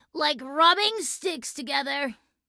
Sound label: Emote Waiting; Gwen; Voice Pack